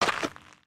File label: Foot Dirt Sneaker Walk, footsteps